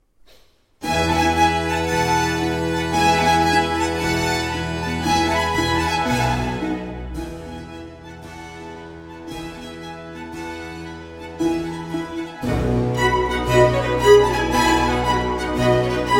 Violin Concerto in E Major, Spring, Allegro, danza pasto